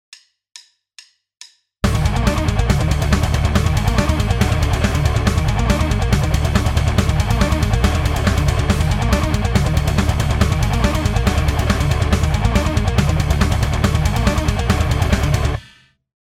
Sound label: rhythm